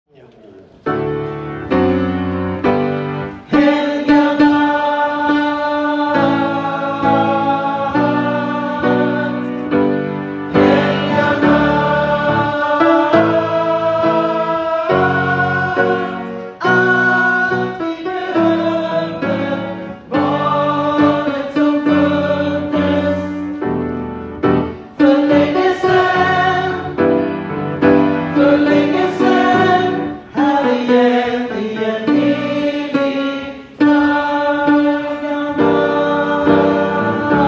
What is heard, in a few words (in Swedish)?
Helga natt Ten Storkor